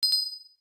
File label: Arctic instant message; Nokia; Audio themes